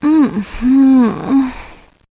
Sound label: sexy mmm notification